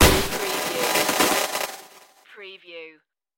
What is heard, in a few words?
electric sizzle impact